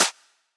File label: percussion hihat F